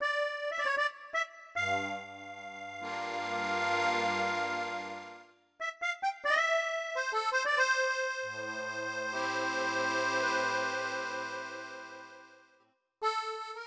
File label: Accordion, piano yamaha p145